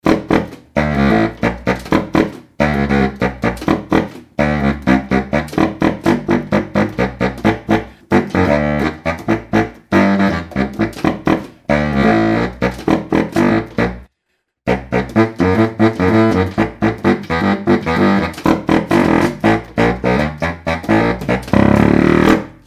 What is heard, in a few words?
Tubax Funk